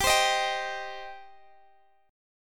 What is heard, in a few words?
G Suspended 4th Sharp 5th, Guitar, Open G